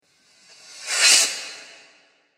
Bright And Fast Metal Transition; Scary Soundscapes; Horror; Crafted Signals

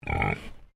Animal Pig Landrace Grunt

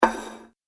Medium Side Plate Hits Table Then Slides; Dishware Sound Effects; Household Sound Effect

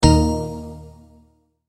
Multimedia Tone-Stop; Tone Sound Effects; Sound Design

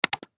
Button Switch; Multimedia Web Interactive

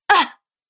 Female Fighter Grunts; Grunt Sound Effects

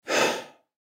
Human Breathe Out Hard Element Male 25 Year Old; Breathing; Blastwave

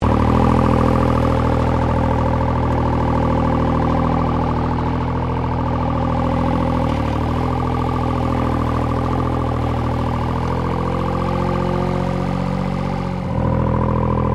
Construction Earthmover Small Operating Loop; Various construction machinery

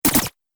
Laser Stun Shots; Laser Gun Sound Effects

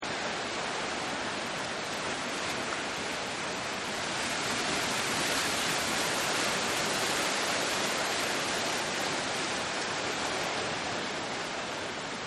Ocean Surf; Ocean Surf Big; Ocean And Sea; Nature

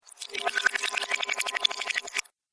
Water - Backward Bubbles; Cartoon Sound Design Sound Effects

